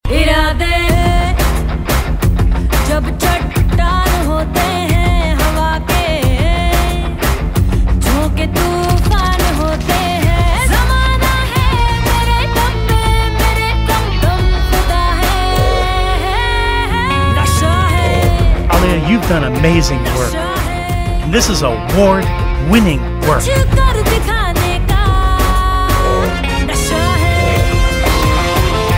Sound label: Promo Song